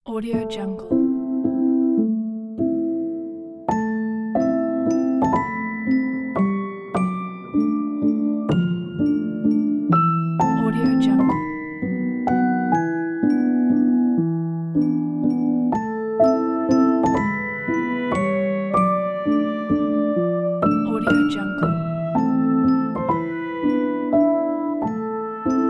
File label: mysterious melancholic classical waltz